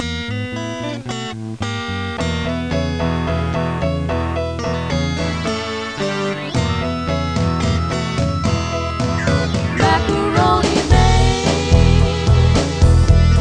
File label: mac music; meetmediaband